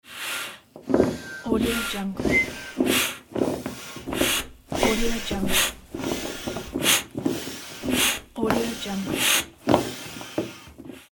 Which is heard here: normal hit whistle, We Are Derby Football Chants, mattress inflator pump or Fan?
mattress inflator pump